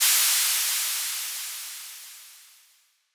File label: White Noise Down; Cymatics; Dubstep Starter Pack